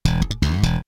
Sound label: cam stop; SB260; LG